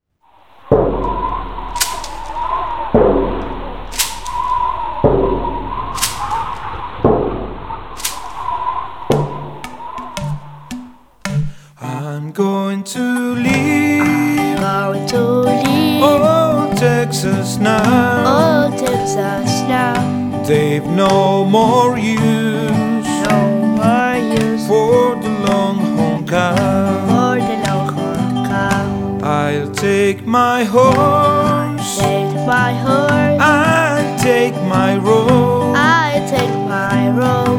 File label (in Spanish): Esta canción responsorial de Estados Unidos cuenta la historia de un vaquero que viaja hacia México; old texas; I'm going to leave old Texas